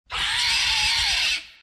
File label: Giant dodo roar